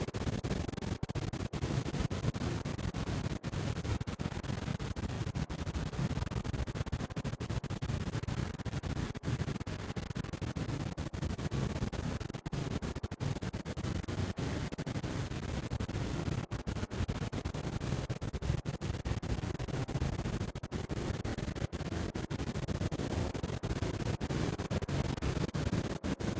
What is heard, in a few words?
Amb Purple Loop; viewfinder